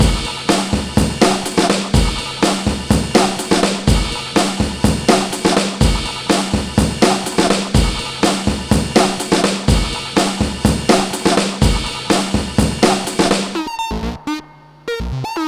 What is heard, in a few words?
TRAILER BREAK 124 bpm; DANGEROUS TRAILER BREAK; 90 s Sample CDs; Planet of the Breaks